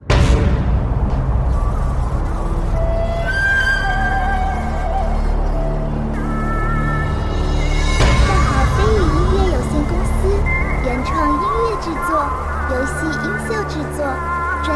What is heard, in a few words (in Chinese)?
危险诡异; 封神再起; 日暮谷